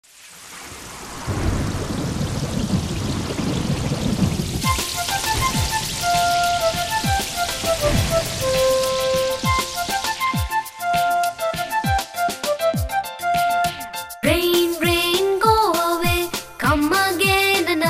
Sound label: rain rain go